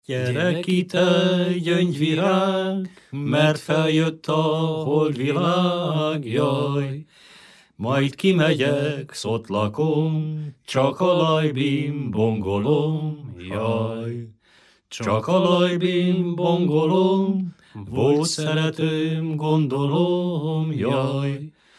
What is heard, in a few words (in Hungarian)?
nepdal gyereki tegyongyvirag